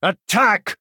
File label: attack; sadras agent